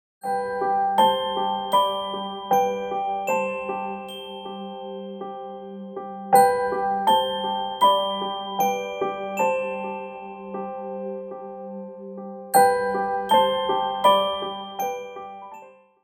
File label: Hopeful Piano